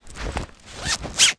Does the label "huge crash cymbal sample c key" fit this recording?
No